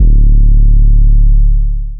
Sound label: 808 D; 808s; Cymatics; Cobra Hip Hop Sample Pack